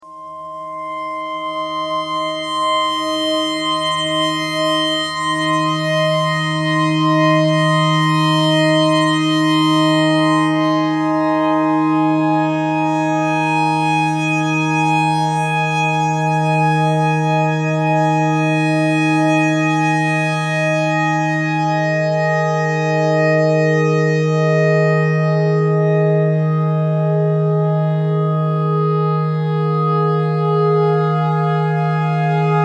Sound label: clarinet notes e clarinet notes e thread pentatonic